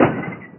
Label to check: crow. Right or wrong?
Wrong